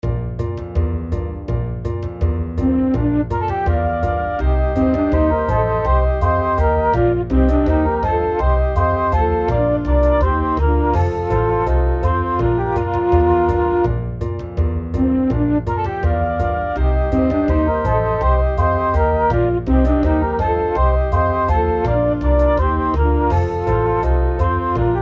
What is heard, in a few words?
Funny and Fast